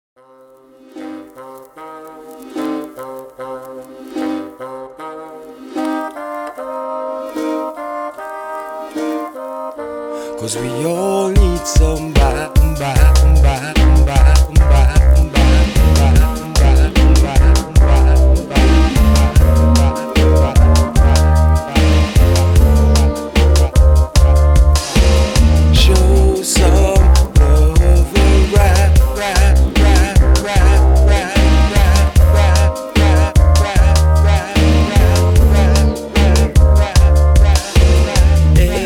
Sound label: Piano